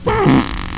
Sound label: fart